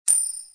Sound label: spin; Brazil; plinko